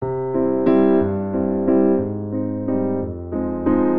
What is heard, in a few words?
Progression Space Waltz 6 8 I - V - bVI - IV C Major 90 bpm, Grand Piano; MIDI Clips; Ableton; Factory Packs